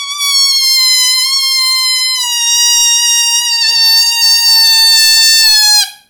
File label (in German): Qietschen Luftballon; Geraeuschequiz